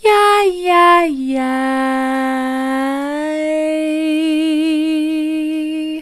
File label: yi yi yi soft, vocal